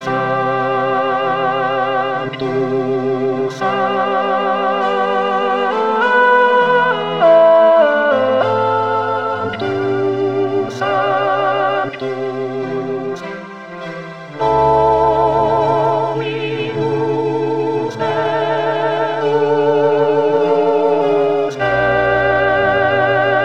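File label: alto; Mozart; Coronation Mass; Sanctus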